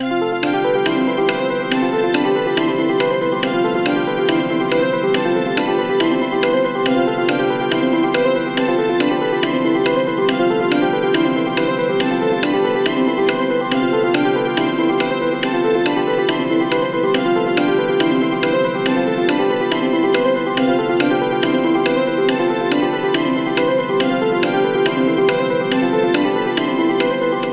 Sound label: background music